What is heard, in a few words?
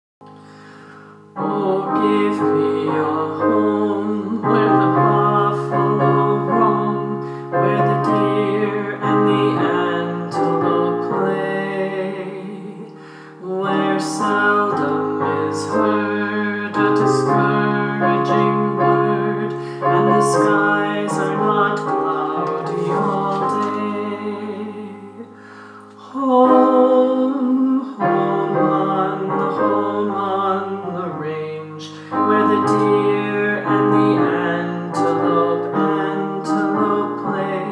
Home on the Range tenor